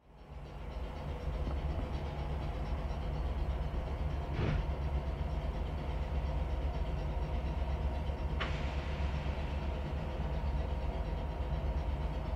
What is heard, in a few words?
Electric Train Idles, Doors Open and Close. Departs, And Then Eventually Slows And Stops.; Idle / Away / Stop